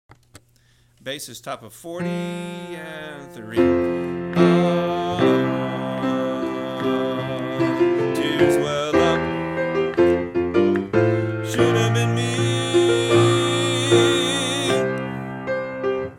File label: Bass